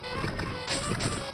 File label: Teleporter Active Idle